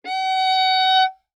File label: Violin arco ff sul D Gb5; MIS Pitches - 2014; Strings